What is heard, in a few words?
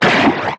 strafer warcry big, enemies; Factorio